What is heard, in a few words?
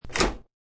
Old Door Knob, Older doorknob quickly twisted; Miscellaneous Doors (Sound Effects:Doors)